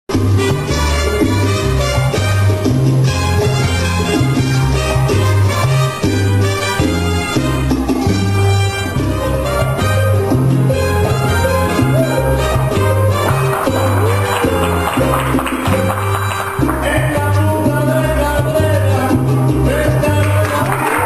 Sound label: Salsa